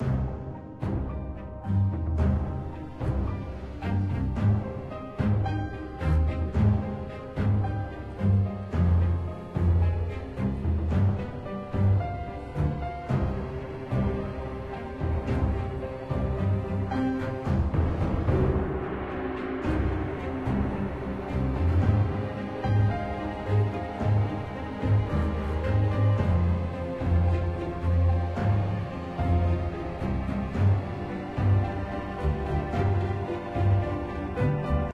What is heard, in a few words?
loop bossa tension